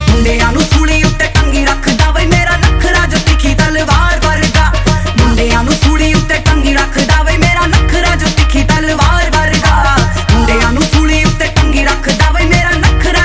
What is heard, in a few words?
DHOL FEMALE